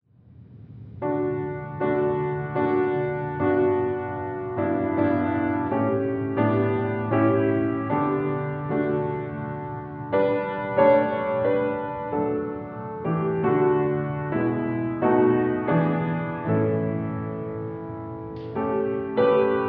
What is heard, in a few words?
Great Is Thy Faithfulness Instrumental